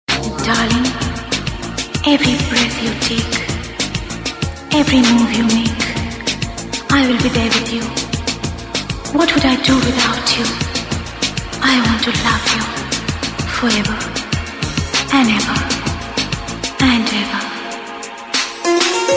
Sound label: Love tone